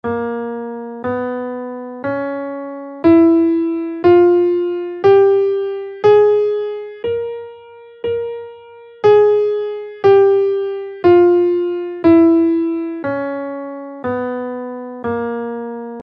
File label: dorian b2 4 scale in Bbm